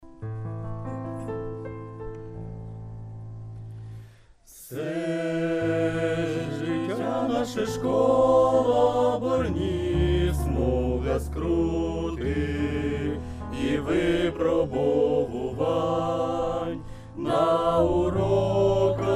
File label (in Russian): penie na ukrainskom; Для семейных; Лагеря; 2010 semejnyj-lagerj